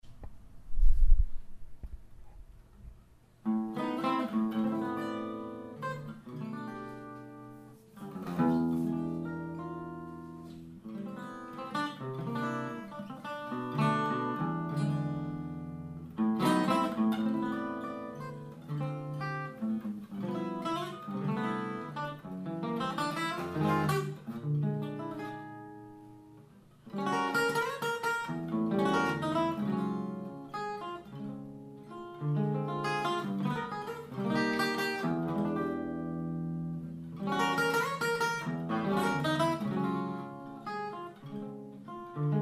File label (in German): akustisch